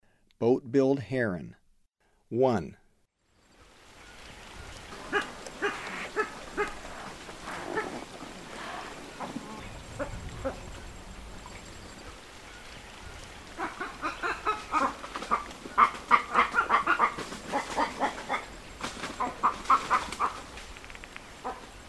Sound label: Boat-billed Heron; S. America; Eastern Lowlands